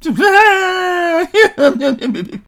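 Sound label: zombie attack; garrysmod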